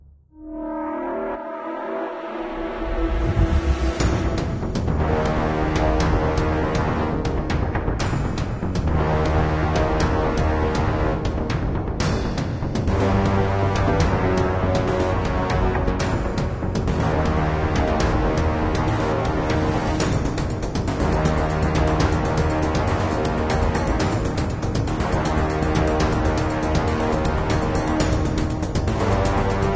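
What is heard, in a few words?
Battle Music; Android; mobile; Windows; Xbox One; Ailment Batte Music 2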